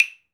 Wood Claves, One Shots Drums

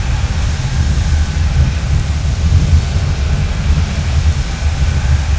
Desert Sand Tower Exhaust Loop; Journey

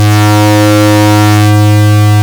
Pulse C2, Synths; Multisamples; Sh Pulse; Ableton Live 12 Lite; Core Library

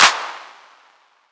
Clap 808; One Shots; Drums; Ableton Live 12 Lite; Core Library

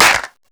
Clap, Drums; Ableton Live 12 Lite; Core Library

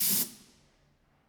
Hihat Open; One Shots; Drums; Mkit

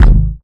Kick; Ableton Live 12 Lite Core Library

